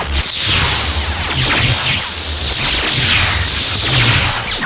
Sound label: Chain Lightning Loop; Defenses; Dungeon Defender; DunDef